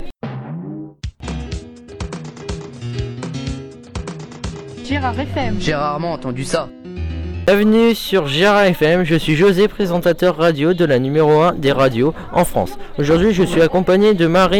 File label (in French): musique